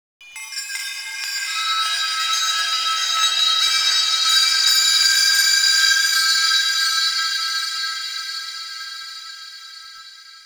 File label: Metal Chimes; Ambience; Warframe; Nokko Colony